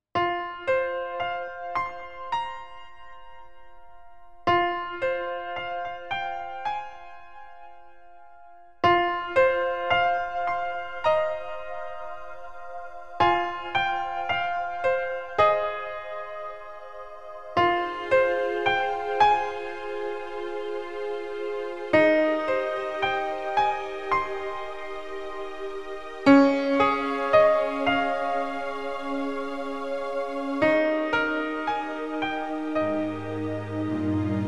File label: keys